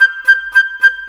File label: Flute, Cinematic; Sonic Foundry (Sony Creative Software); Orchestral Series VOL-3